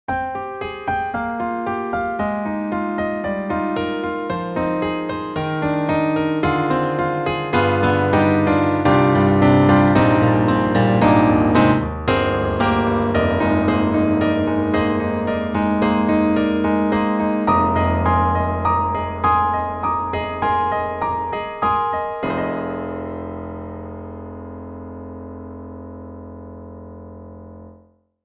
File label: Truepianos Amber Narrow; 4Front